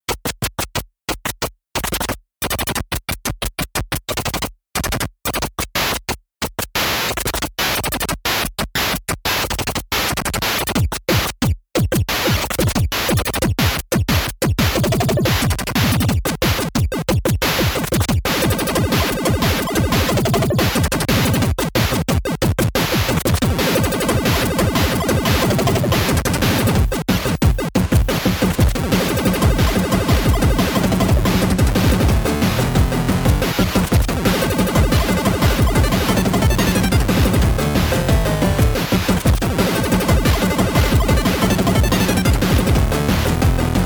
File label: d minor etude; weeklybeats 2014